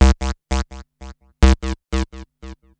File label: Riff, Synths BASS